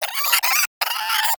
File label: Cryptilex Vocal Alerted, Hunting Critters; Characters; Warframe